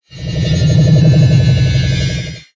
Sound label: shield battery; starcraft; protoss